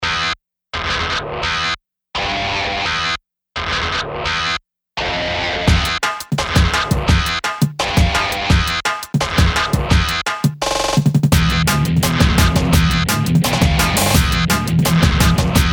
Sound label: Stuttercut